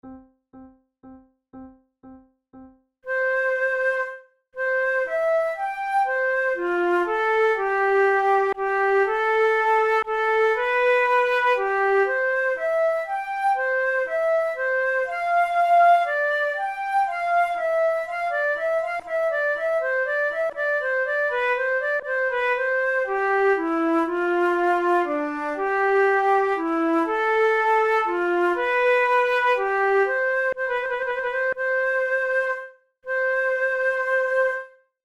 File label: prelude, flute; boismortier op 22 no35